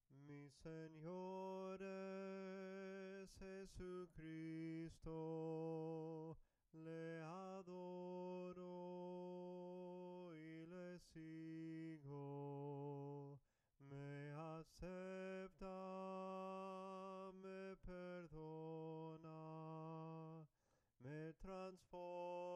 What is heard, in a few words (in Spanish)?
MI SENOR ES JESUCRISTO SOPRANO CANTADO; cantos del camino